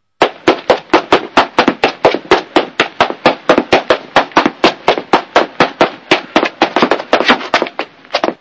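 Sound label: footsteps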